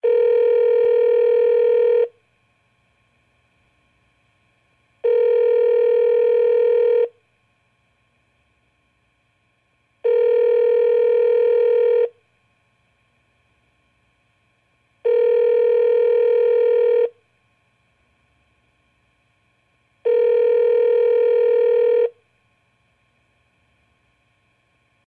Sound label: Ringback; SK telesys SK-S100